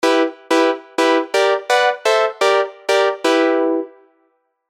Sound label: Stab Metro; tech house; SERUM